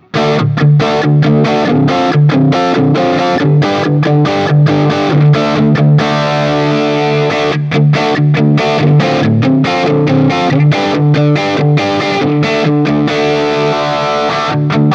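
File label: Guild M75 JCM800 D, guitar; Aristocrat; Gold; goldtop; 2014 Guild Newark St. M75 Aristocrat in Gold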